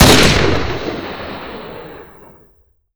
cheytac

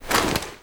foley gear rattle sprint

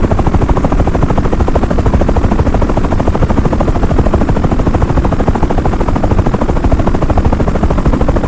rotor OH58, aircraft engines; DCS

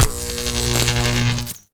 spark strob

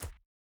wolf footstep concrete hard; Rust

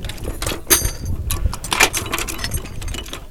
Vehicle reloads; Red Orchestra 41-45

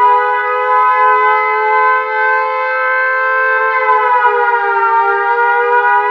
siren airraid

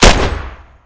Inf Weapons; Red Orchestra 41-45

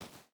footstep barefoot stones jump start; Rust

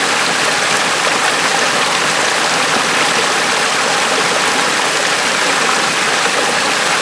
waterfall bubbly close; Call of Duty Modern Warfare

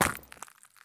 sprint glass; Escape from Tarkov

